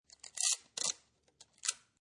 Tape Roll Pull And Tear, Pull tape directly off tape roll and tear off piece of tape.; Offices